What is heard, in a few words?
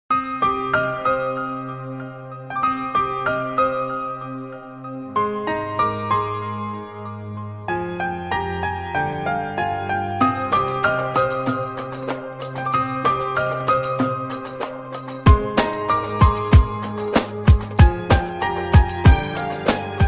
piano rythm ringtone